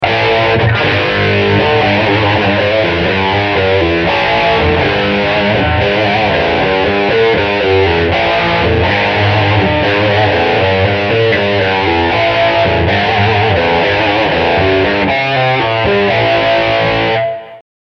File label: Power Riff Humbucker Rear